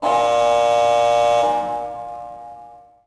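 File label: ambience interior buzzer; Tony Hawk's Project 8; XBOX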